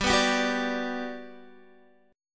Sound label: G Major 7th, G, down, Guitar, DADGAD